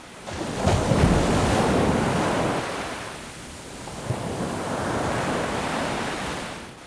sea

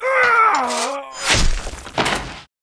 Mob die; SubBossmob; 욱일승천류; 겐지; 2단계

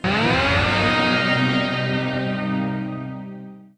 level up, interface

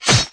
MOB BATTLE AXE, Battle Mob Weapon

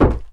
DROP WOOD

